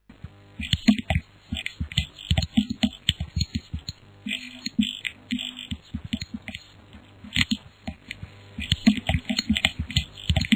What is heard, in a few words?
noisebeats instrumente; rauschpartikel